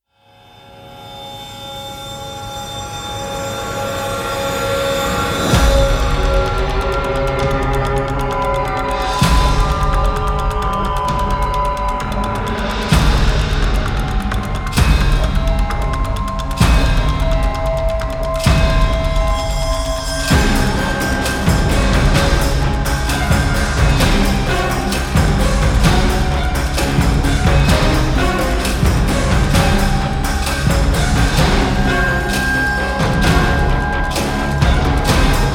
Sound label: ACTION ORCHESTRAL; Old-Daisen